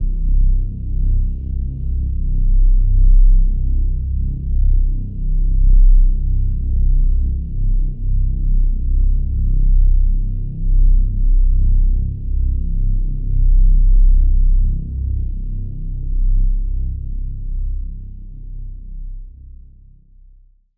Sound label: REESE (C); Basses; Eternity; Cymatics; Orchid Sample Pack; 808s & Basses